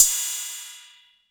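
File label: TR606 Cymbal, Roland TR-606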